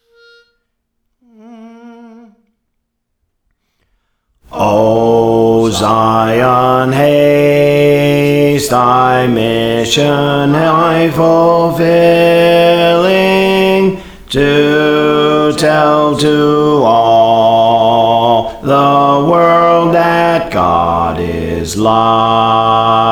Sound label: Bass, Songs; O Zion Haste; Praise For The Lord Training